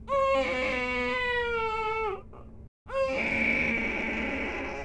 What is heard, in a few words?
baby; Mafie 1